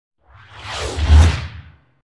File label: Low Double Whoosh; Hollywood Edge Sound Designers Tool Kit